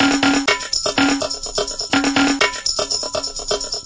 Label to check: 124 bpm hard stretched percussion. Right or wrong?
Right